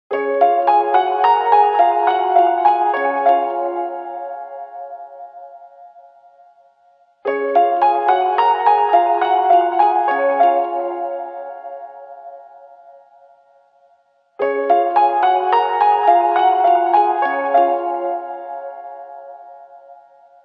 piano

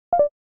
call disconnect

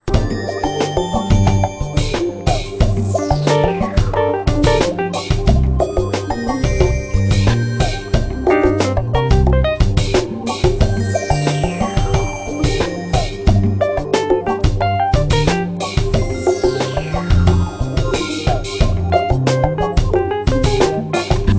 Lounge Club Groove; Nokia; 6233

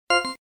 Disconnect

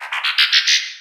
notifier Transform; ZTE Open-C

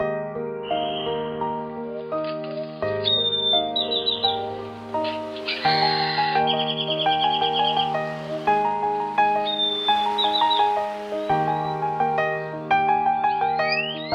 Morning run; Meizu MX4

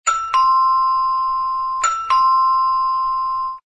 Door Bell; Amoi; Skypephone-S2

